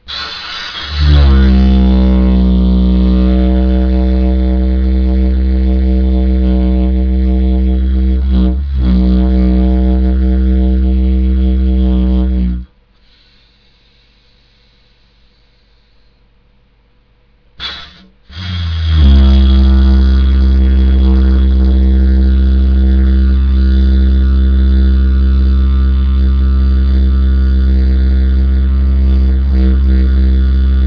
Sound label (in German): didgeridoo